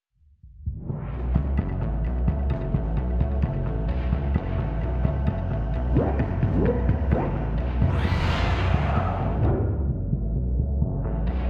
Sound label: Cave Music Memory Full Transposed Intro